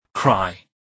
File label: cry